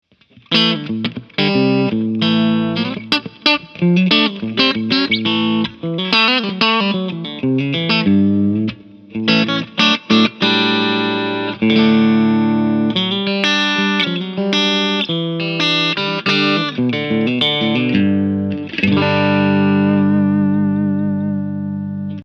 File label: clean; thr; el84